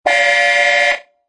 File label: car horn